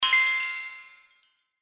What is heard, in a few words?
bonus